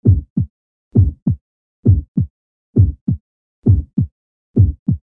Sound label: heartbeat slow